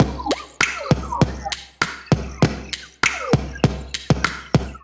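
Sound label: Two Rooms Kit 99 bpm, Vocal Percussion; Ableton; Factory Packs; Voice Box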